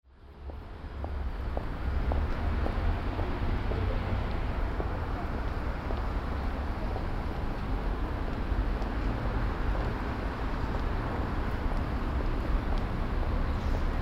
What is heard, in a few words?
clock chimes; Reading